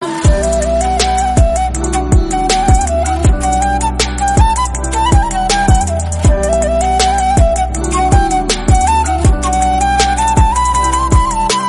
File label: BROKEN HEART FLUTE RINGTONE